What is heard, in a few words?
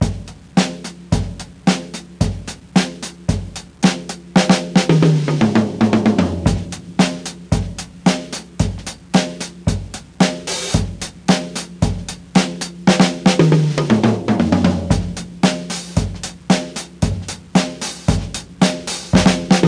rythm, drums; music